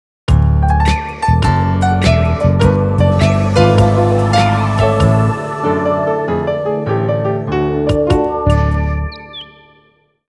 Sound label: Sky Zone Completion; Frogger He's Back